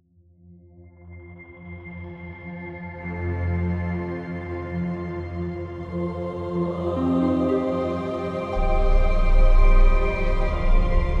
Strategy Ambience; Master of Orion

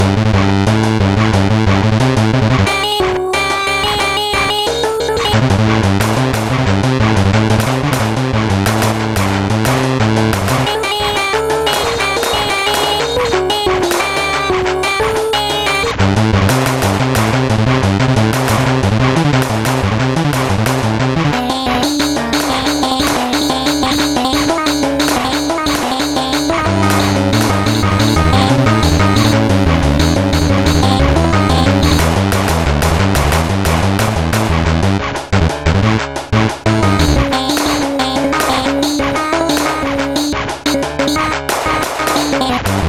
With Gunshot SFX; Friday Night Funkin' Glitched Out vs. Ashura OST PC mod gamerip

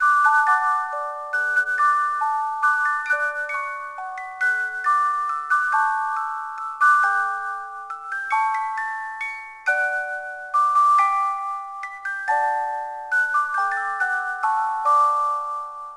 Lullaby; Virgin Interactive; toonstruck

